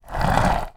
sport skateboard small childs short roll carpet floor